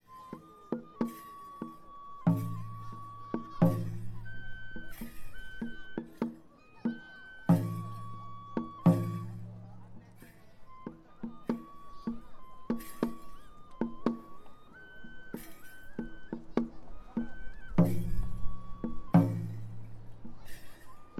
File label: kagura, Fukushima